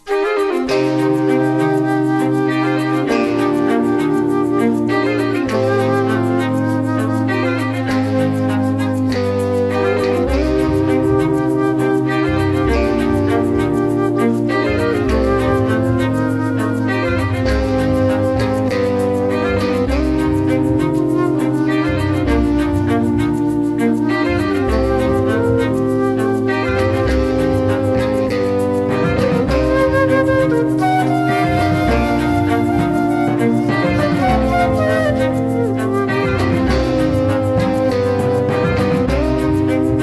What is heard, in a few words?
Flute; Surprise Symphony